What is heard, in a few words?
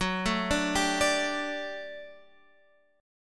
G Major 7th Suspended 2nd Gb